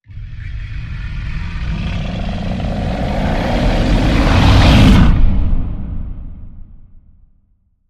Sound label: Yeti Growl Rise